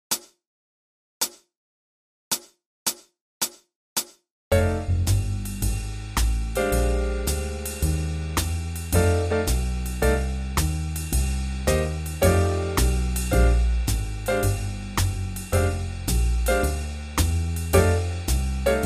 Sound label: Blues in Concert F; TMEA Jazz Auditions